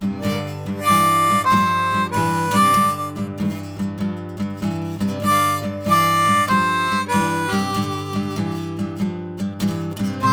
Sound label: Easy Blues Exercise In G, 2nd Position, Blues Harp